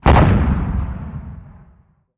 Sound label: 175mm Howitzer Fires